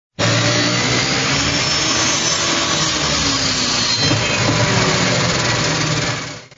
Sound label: skilsaw